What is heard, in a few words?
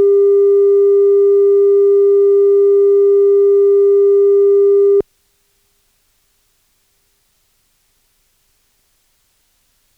315Hz